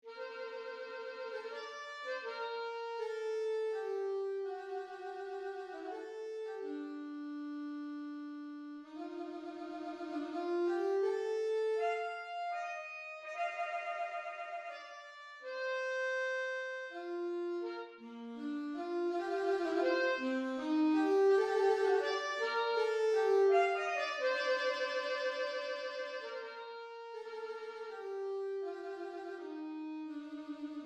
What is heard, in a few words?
Alto Saxophone